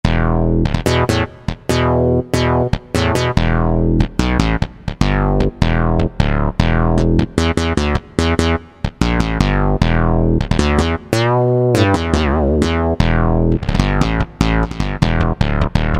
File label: SYN.BASS, Roland SN-U110-09 Guitar And Keyboards